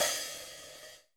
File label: cymbal, Roland MC-909